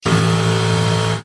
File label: deep buzzer